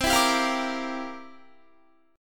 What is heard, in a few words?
C 11th C down Guitar; strummed